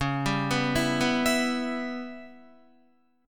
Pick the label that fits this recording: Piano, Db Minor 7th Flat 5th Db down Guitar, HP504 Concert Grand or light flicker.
Db Minor 7th Flat 5th Db down Guitar